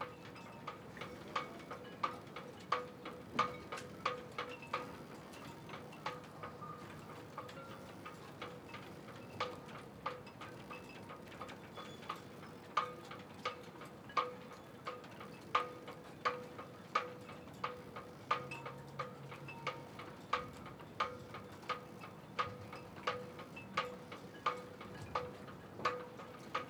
Sailing loopable

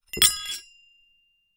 Hole Saw Rattle, Hole Saw Bits

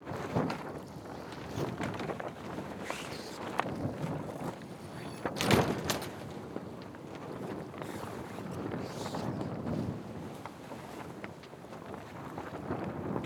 Sailing

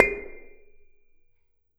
Bonang P, Gamelan

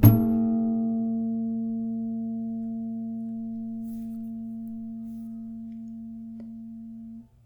Classical Guitar Extended Technique Plink

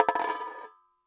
Coin On Collander

